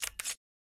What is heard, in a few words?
camera click